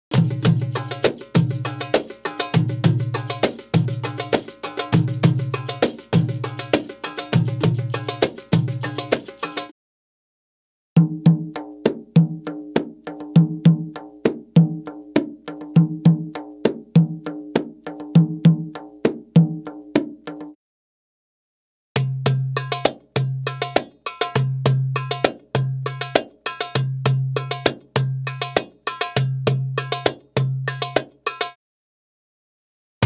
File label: Arabic Rhythms